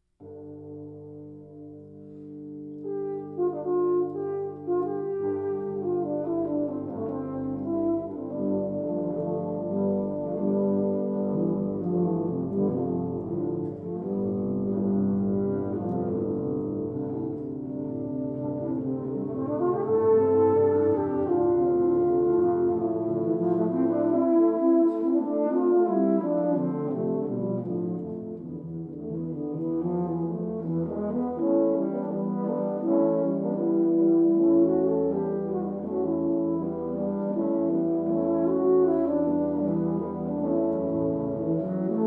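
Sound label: tuba euphonium ensemble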